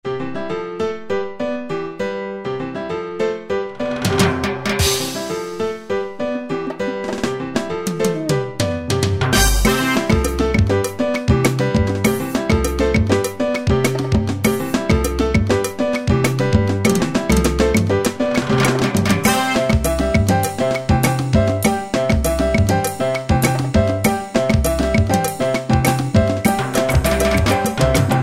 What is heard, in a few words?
Salsa, Yamaha QY70; Preset Styles